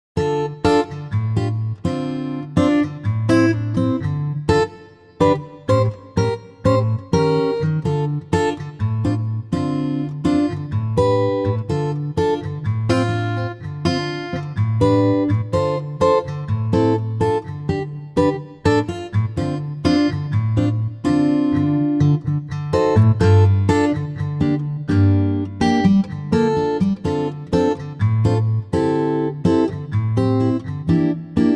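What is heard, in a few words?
Dm Ebm acoustic guitar; key - Dm to Ebm - vocal range - C to Db; Superb acoustic guitar arrangement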